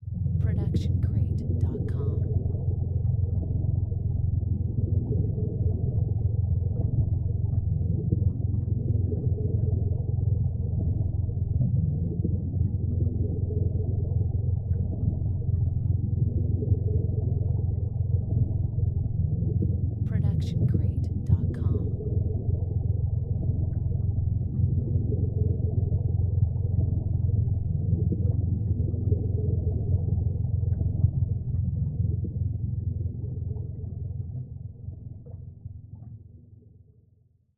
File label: underwater sub drone; soundscrate